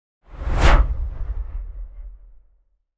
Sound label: Deep Whoosh